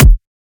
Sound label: Kick, Dubstep; Dark Matter